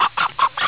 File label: cluck, chicken